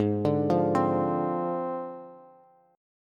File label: Ab 6th Flat 5th Ab, Bass; arpeggiated; JGuitar